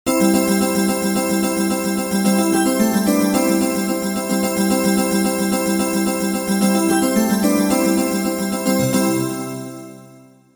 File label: winter morning bells ringtone